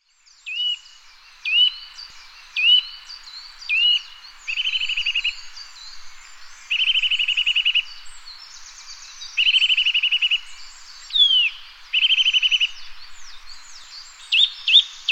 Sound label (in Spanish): TREPADOR AZUL